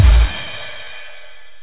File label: Drum Soft Med Finish